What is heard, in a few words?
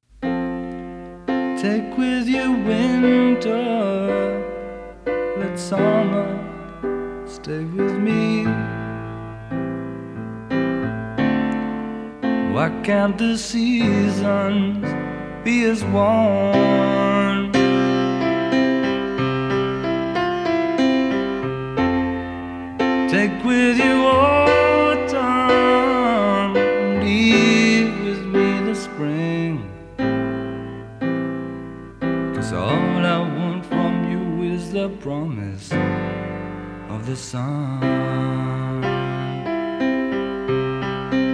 acoustic